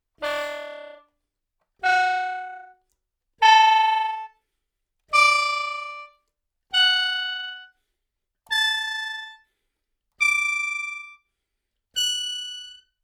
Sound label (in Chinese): 传统笙 演奏技法 花舌